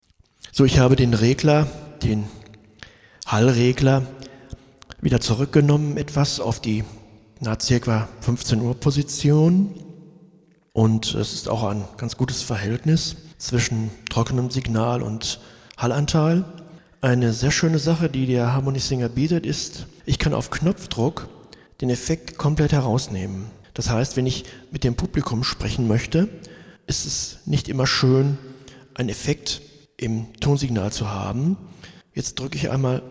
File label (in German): Harmony Effekt Bypass